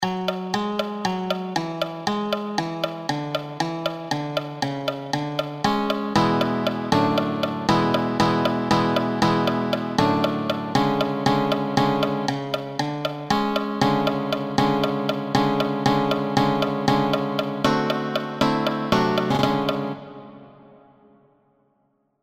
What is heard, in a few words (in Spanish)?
Buleriando Esquema Ritmico; falseta de buleriando